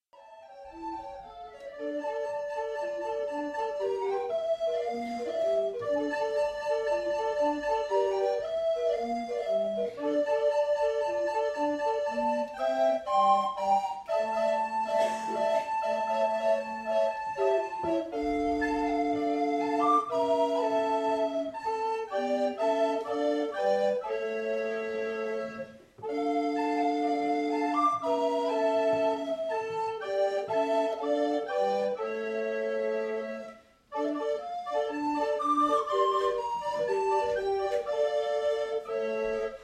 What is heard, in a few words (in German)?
Blockfloetenensemble